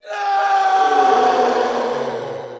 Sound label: human scream; cstrike; biohazard